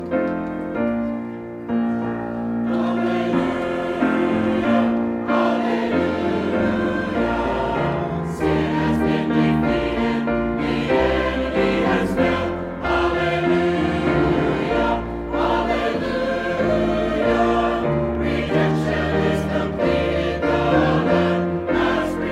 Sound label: Halleluia Halleluia; gospel media